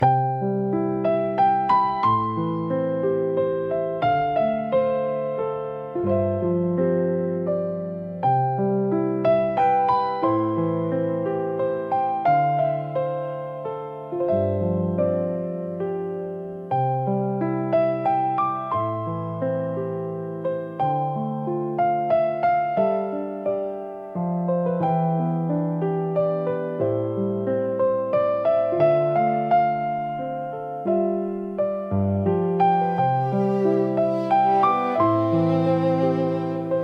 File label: fantasy music nature forest; ghibli style; healing; nostalgia